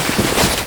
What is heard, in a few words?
Bag Stash; kult